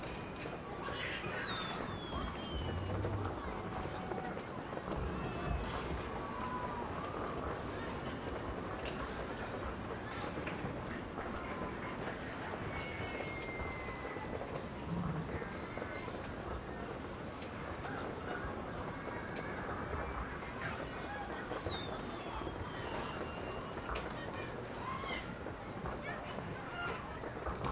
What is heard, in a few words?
amb penthouse; tft